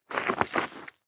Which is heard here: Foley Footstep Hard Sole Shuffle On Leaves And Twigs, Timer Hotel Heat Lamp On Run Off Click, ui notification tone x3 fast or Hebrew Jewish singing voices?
Foley Footstep Hard Sole Shuffle On Leaves And Twigs